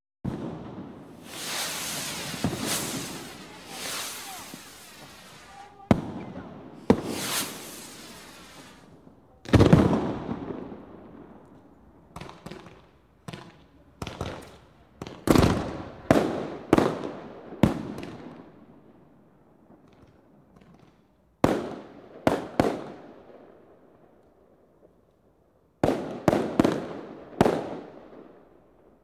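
Firework Display, Exterior Atmosphere Ambience With People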